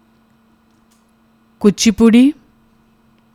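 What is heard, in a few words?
Kuchipuri, Indian classical dances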